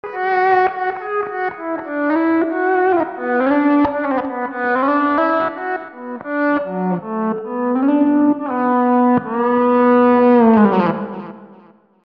slow; gs10

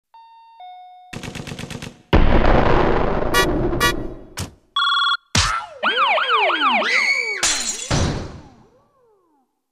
soundfx; SPD 20

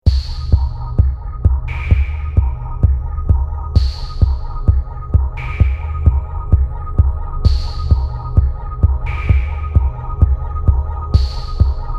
909 electronica

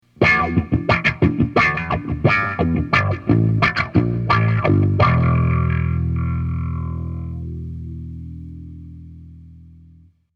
bass mix

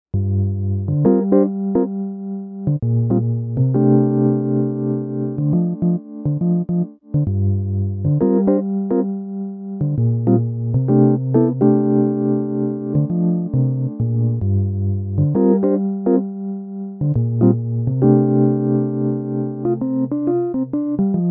Mellow EP

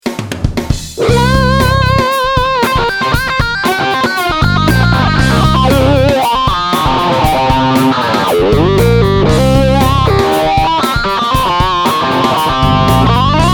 patch demo

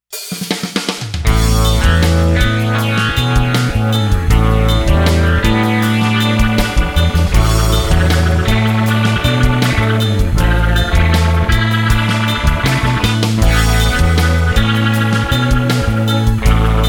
rotary crunch

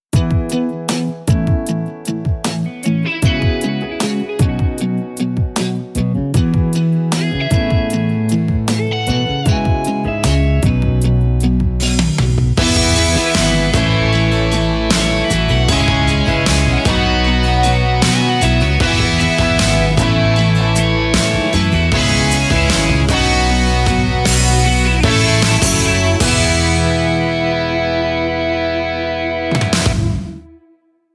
ballad

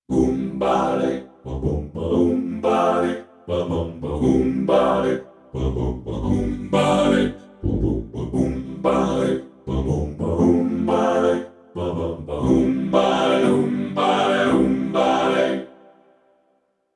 vp-7 classic

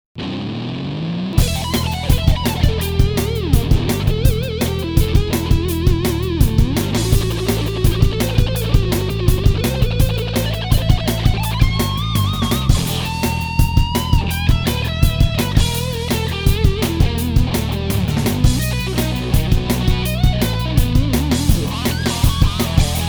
rock n 8

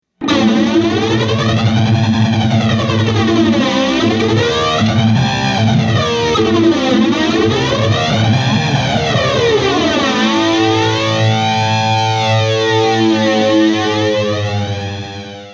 metal flanger; cube 30

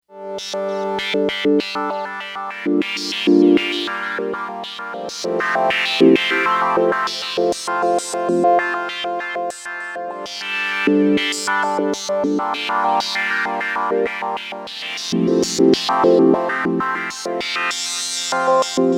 sh 201 s and h fx

